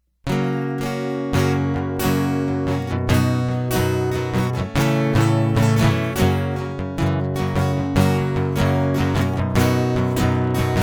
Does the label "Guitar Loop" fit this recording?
Yes